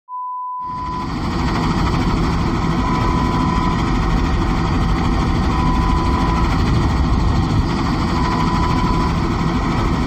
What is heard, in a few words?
Helicopter Sound; Lo-Fi